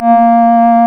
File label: REED A#; Big Fish Audio; Synclav FM